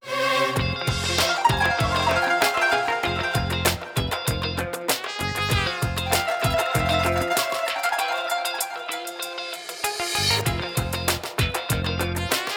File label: Dididida, ringtones; Venus